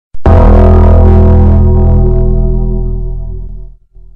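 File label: bell bass boosted; undertaker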